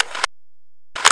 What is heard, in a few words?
PRELOAD; Operation Pest Control; quake 2; weapons